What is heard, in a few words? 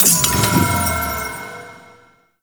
Crystal Destroy; Weave Boss